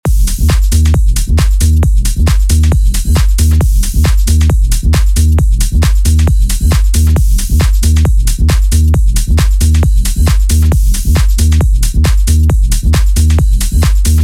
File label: loop; GIMMICK; Sample loops for testing the AI music generator; D# minor